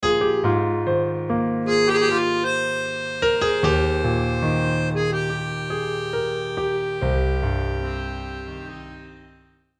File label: sad piano